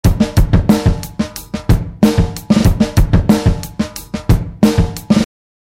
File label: drumloop